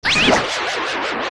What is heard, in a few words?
torch slug die; OOT